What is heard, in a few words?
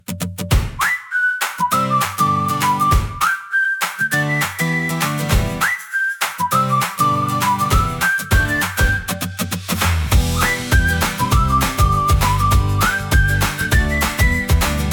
Morning Walk Whistle